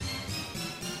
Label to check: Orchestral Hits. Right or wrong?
Right